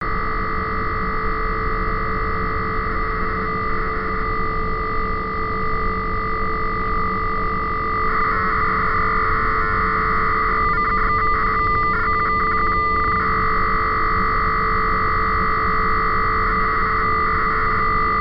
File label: laser psk